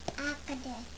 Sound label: alligator